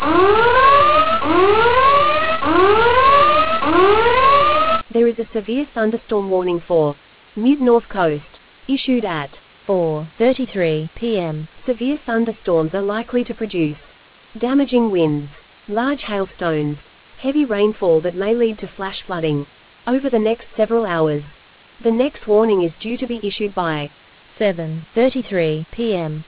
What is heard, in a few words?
storm audio